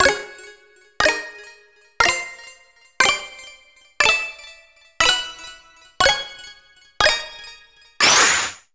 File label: Multiplayer SFX - Collecting Clams; Clam Blitz